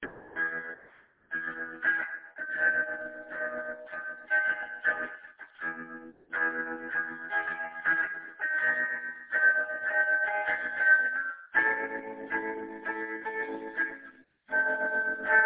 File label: like quiet snow night chorus